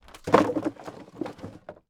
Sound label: IMPACT Wood Plank On Wood Pile Multiple; mono; IMPACTS; Universal Sound FX